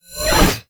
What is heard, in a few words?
Bayonet Melee Combo